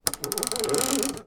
van cab temperature control dial move slow mercedes sprinter